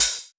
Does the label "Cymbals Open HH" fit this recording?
Yes